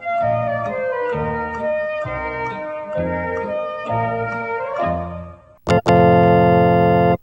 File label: tada; Jeopardy